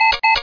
Pager; heat

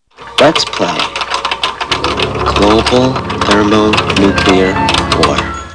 nuke war

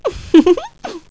giggle; VEGAS Girls

